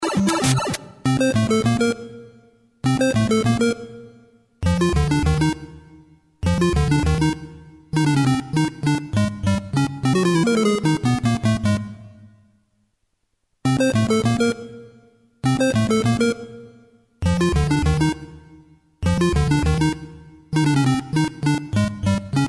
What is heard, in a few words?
castle music